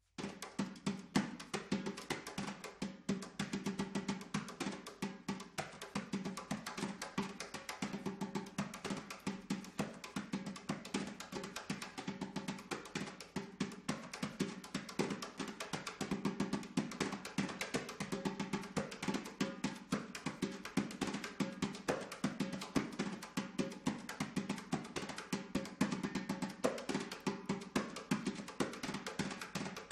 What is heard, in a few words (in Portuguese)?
repique de mão